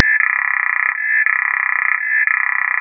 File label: Male Ad Call